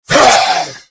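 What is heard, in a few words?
lizard attack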